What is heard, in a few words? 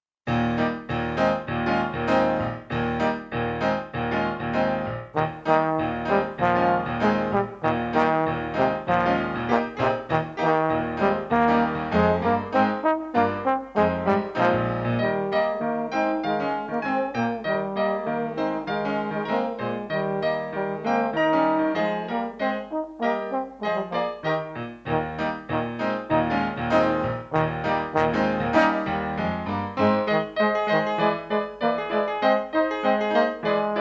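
All Jazzed Up Trombone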